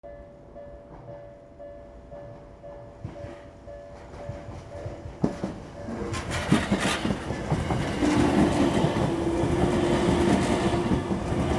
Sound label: train